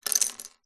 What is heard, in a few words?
leisure lego pieces set down on wood table; zapsplat